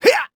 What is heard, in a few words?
throw